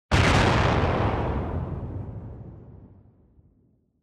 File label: Explosion Short